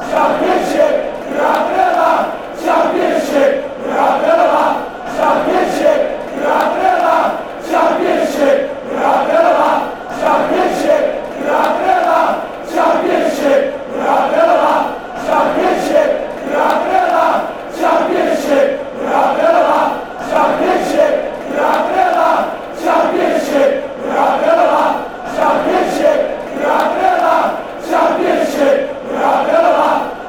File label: championship youre avin a laugh, chants